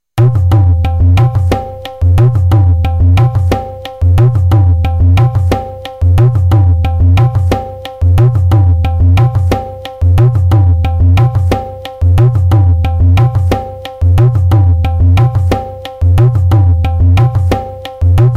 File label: dadra drut 180bpm